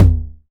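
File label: 909 mid tom; Goldbaby Tape808 & Tape909; The Tape 909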